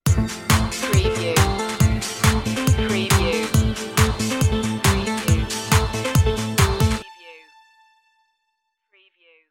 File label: dance stinger